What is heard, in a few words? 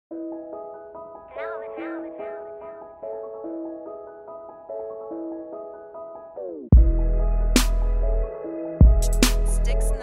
Love Trap